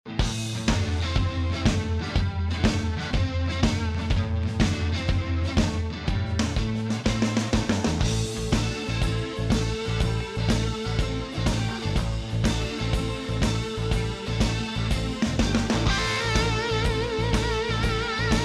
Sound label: Blues